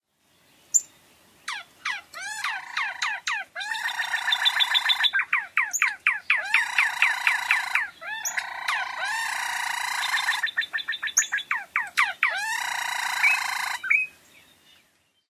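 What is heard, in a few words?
calls and song of courting pair, bird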